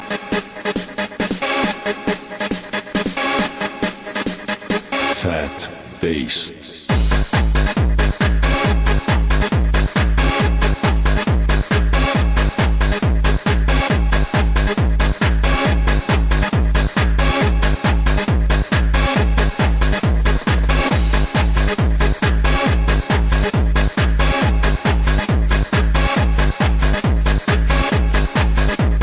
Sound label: club trance